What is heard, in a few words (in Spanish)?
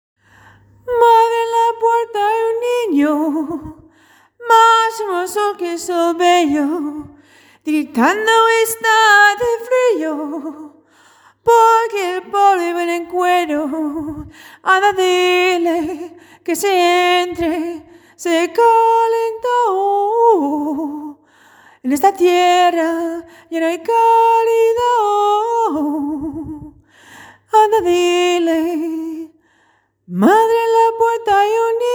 niño soprano